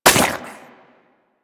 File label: Grineer Chem Strike Nox Rifle Projectile Impact